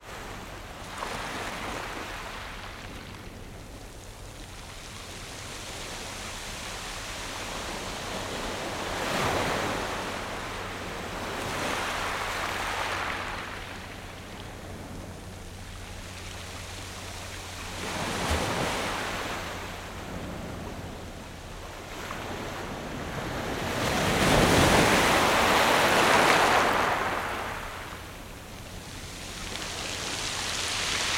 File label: water ocean waves break on shingle stoney beach